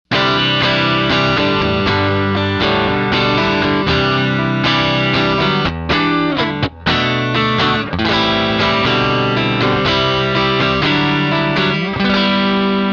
Fender Stratocaster, EHX Soul Food Stereo, Roland JC120 x2